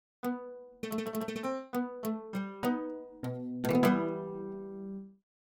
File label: oud; world music